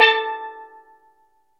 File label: steel drum